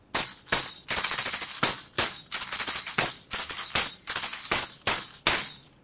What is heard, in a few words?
tambourine; western